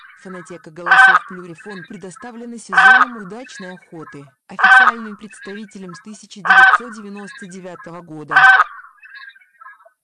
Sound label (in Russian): Гусь гуменник